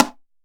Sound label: HALAM KNOCKS; Best Service; World Colours